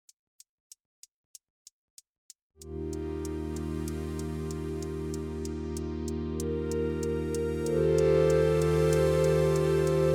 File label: Tubes and Wires